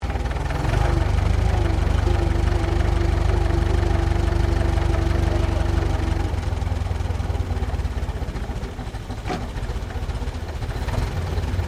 Truck Chevrolet 1926 30cwt Maneuver Slow Gear Grind; Classic comercial vehicles